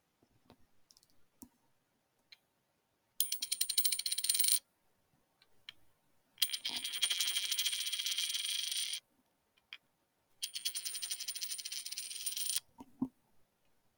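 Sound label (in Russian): звук монет, звона монет и копеек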